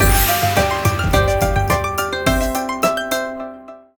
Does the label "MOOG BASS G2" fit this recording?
No